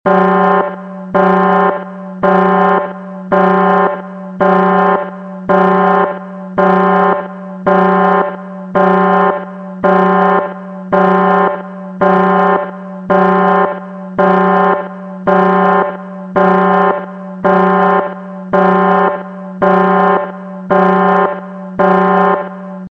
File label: alarm